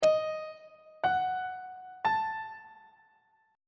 e harmonic minor chord 7 is d sharp diminished on treble clef